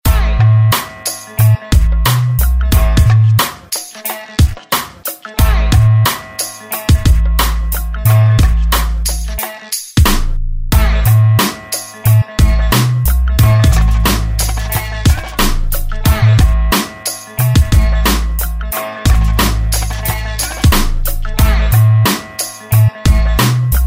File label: beat